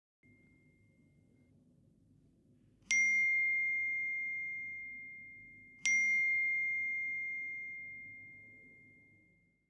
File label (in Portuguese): Mensagem No Celular